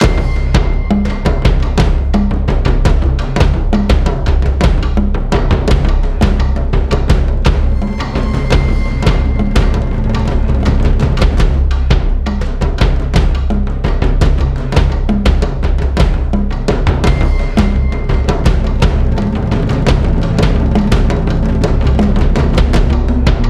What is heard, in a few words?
drum runner; dream kit zenith; majetone